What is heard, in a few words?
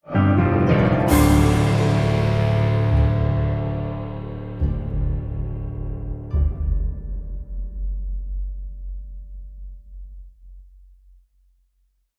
Stinger; deathtrap 2015